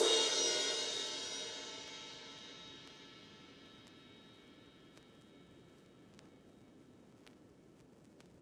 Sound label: Vinyl Ride